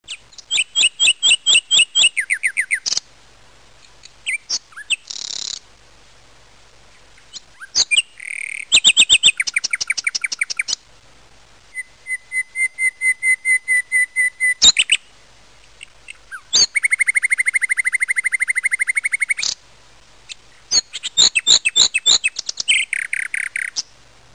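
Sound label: nightingale; animals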